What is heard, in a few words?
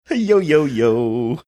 singing yo yo yo